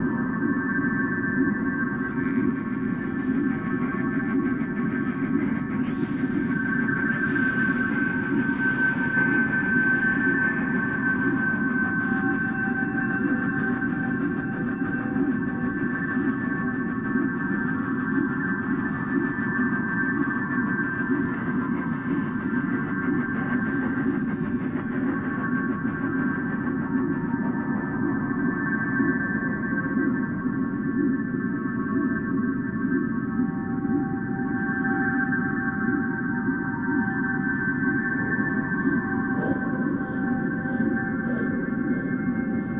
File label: Whirlwind, Dreamscapes; Digital Rain Lab; Dreamscapes vol.2